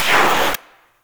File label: beam stop; slender; garg